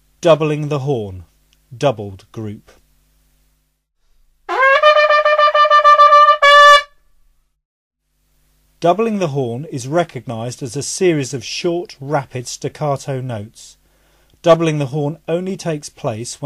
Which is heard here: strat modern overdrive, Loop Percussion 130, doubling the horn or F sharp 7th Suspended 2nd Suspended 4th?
doubling the horn